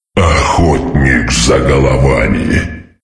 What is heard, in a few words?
headshot